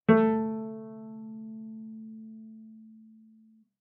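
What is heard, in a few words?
Cello pizz fortissimo sul A A3; MIS Pitches - 2014; Strings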